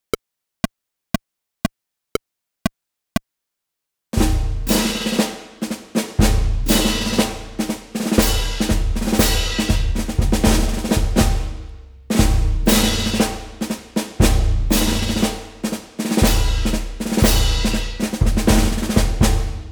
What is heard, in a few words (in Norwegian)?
Trommemarsj; KNMM